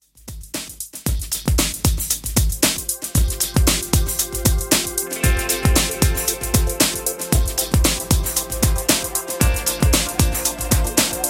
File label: 90s Redrum 115Bpm